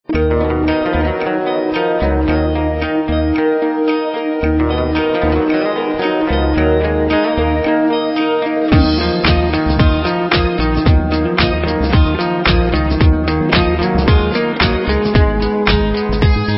nice guitar

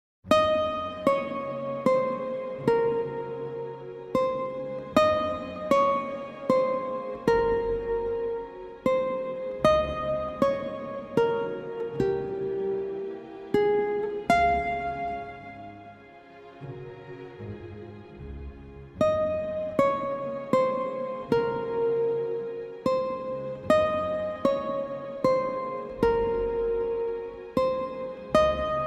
guitar

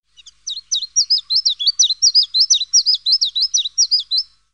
phylloscopus collybita

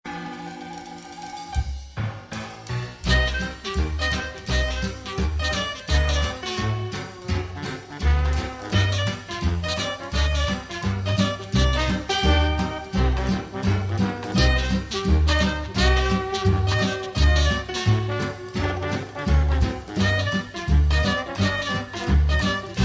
great jazz

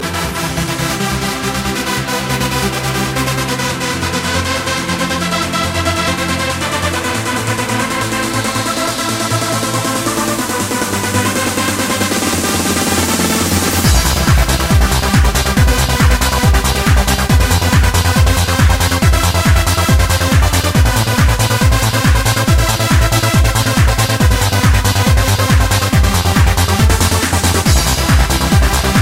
trance

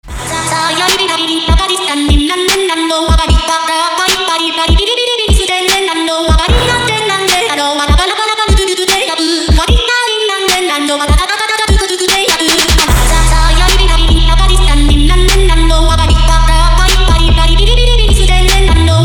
polka

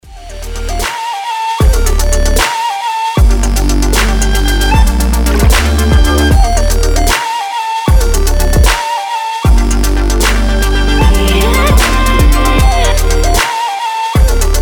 jelly trap

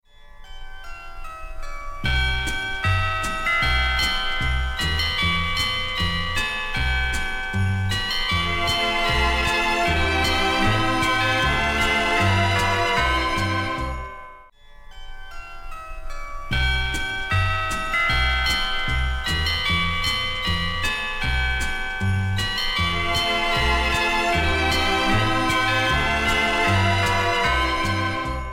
instrumental frosty

